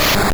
Wrap Single; sfx; attack moves; Pokemon; gen 1; rby

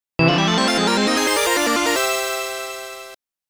Complete Mastery (Ping Pong Clear); Ganbare Goemon Sound Treasure Box

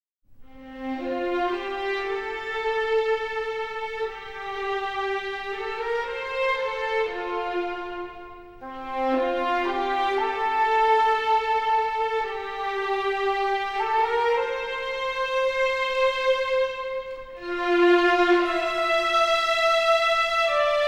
nocturne for orchestra; ouran high school host club

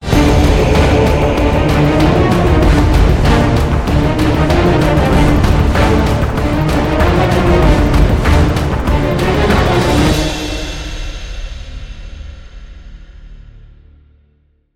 victory; call of duty modern warfare 2

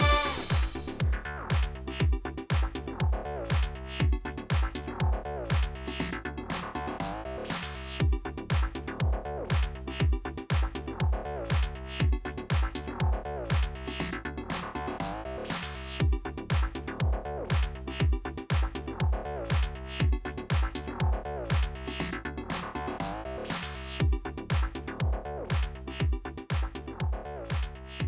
Heart Beat Check; Ring Fit Adventure

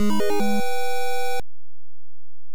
Miss; Hokuto no Ken; Family Computer

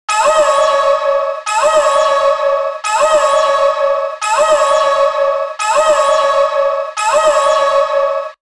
Warning; Taito Retro Game Music Collection 1; Shooting Cluster

